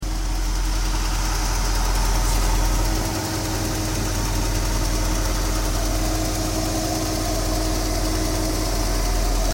ticking noise front left tick; XJ XJ6 XJ8 XJR X350 X358

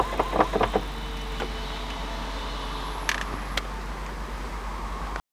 power window problems 98 xk8; XKR X100

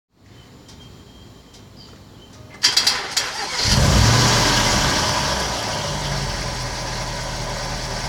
strange engine sound start up, jaguar start up; Jaguar XF XFR X250; electrical gremlins

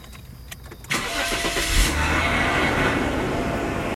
metallic sound startup, jag startup; XJS X27

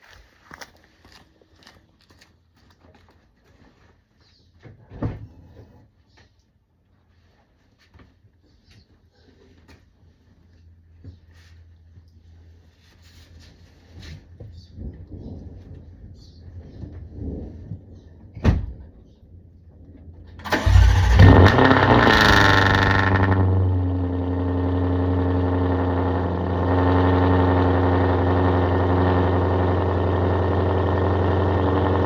jag cold start, non valved race exhaust; F-Type X152

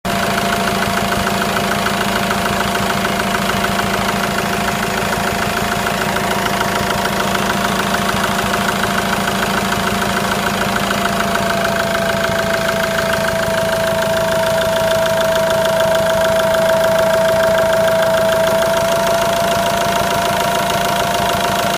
turbo whine; turbo issues; x-type x400

